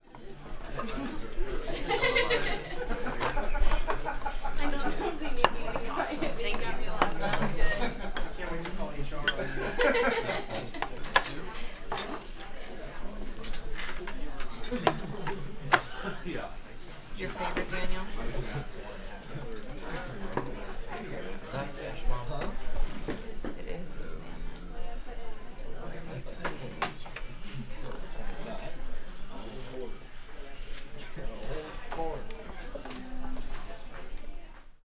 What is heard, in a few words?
Ambience Restaurant